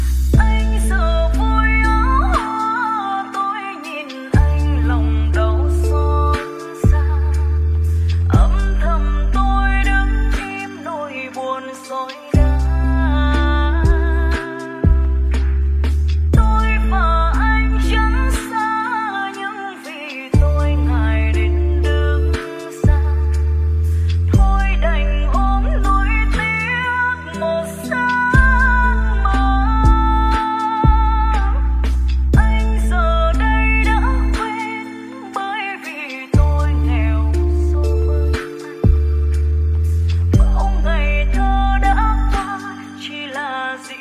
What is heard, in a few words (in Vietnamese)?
nhac chuong lofi ver